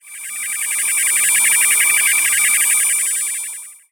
transmission; sonniss gdc

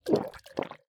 drink liquid; consumables potions vials, drink liquid; psfx

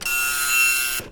dot matrix vintage printer long burst; fvtt sonniss gdc audio

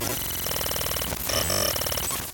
ui glitch glitching digital data corruption loop

